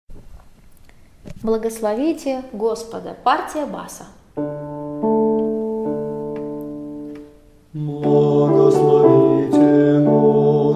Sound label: bas; Blagoslovite Gospoda